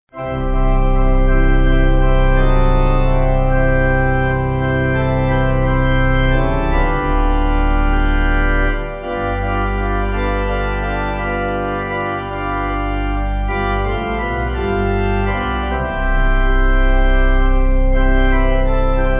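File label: Pipe Organ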